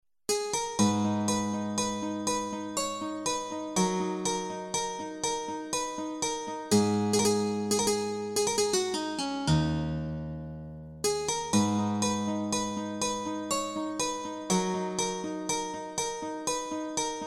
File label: guitar, yamaha tg500